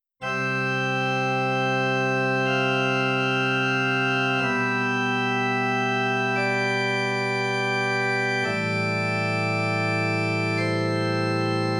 pipe organ; Casio PX-S3100

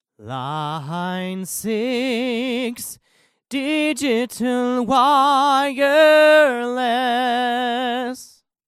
beta58 line6; Line 6 XD-V70

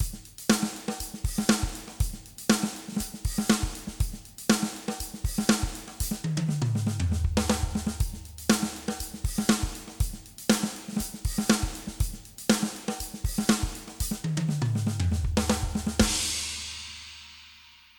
drums rs135 8khz 6db; softube abbey road studios brilliance pack

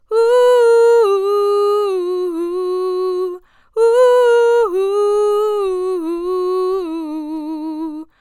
Edge duo singer duo orig; Antelope Audio; Antelope Audio Edge Duo Test

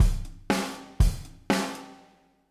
vintage kit basic; toontrack ezdrummer 2

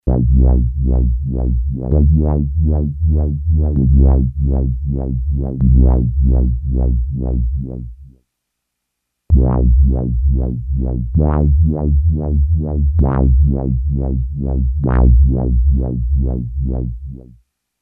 behringer model d wobblebass; Kompakter Desktop Synthesizer

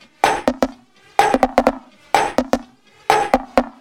126 acid classic; expelectronic

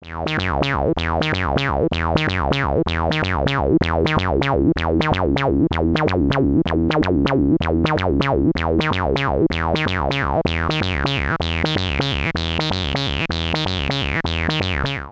korg minilogue bass

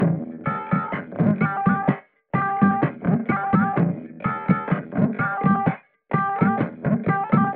percussion line

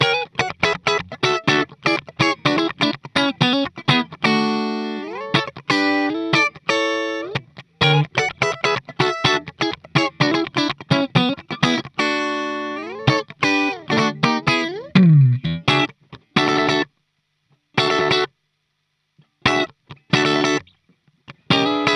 TAD Blackface 22 reverb

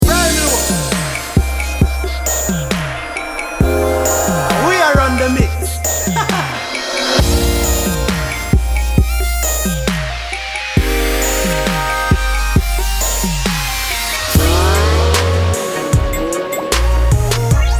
RnB Slow Jamz

